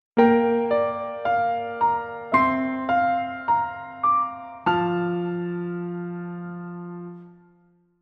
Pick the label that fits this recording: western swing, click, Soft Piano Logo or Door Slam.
Soft Piano Logo